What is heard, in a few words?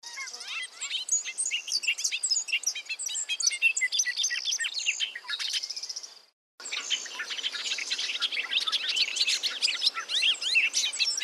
BOBOLINK; species/birds; wbba